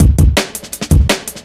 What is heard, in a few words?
165, drum loops, drum & bass